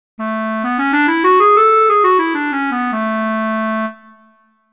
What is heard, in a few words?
clarinet; kontakt player; sibelius